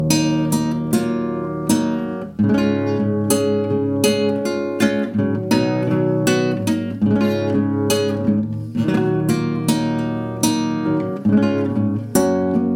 classical

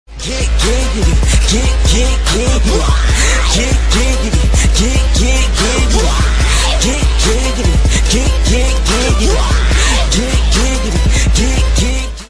chorus

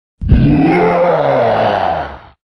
roar; re3 nemisis

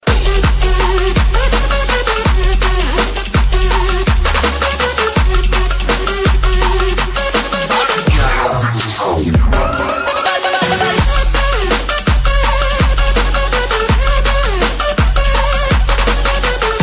Trap

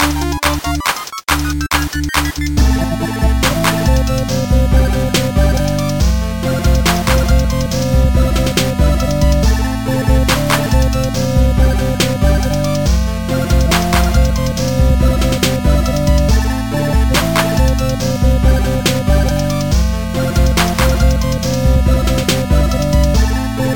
8bit twerk music

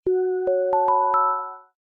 cool msg tone; ringtones; reggae

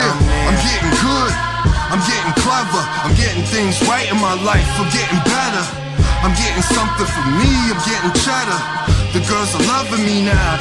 children sing; hip hop

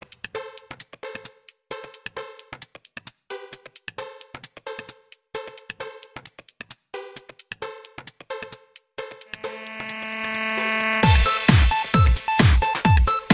rock; holiday

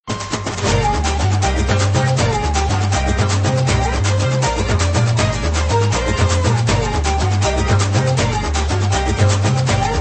techno

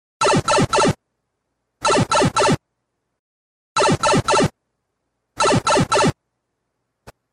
Cute Phase

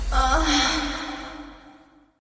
Ahhhhh

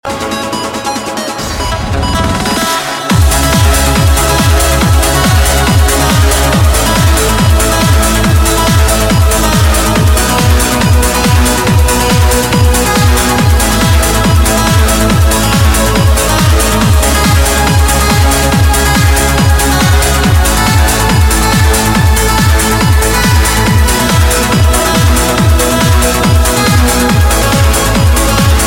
trance; electronic

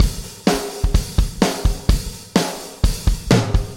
big drums, rock; ringtones

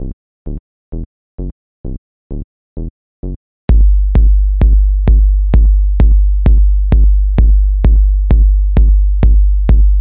bass tone

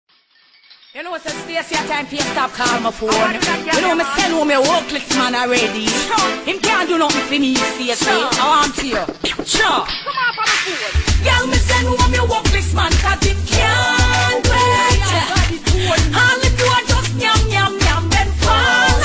riddim

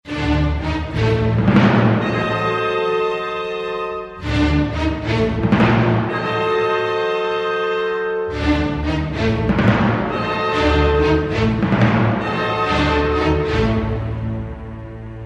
ringtone

